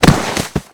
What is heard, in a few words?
bodyfall dirt